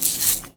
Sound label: Foley